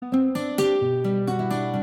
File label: Warm morning; Tecno Phantom Pad II (G9)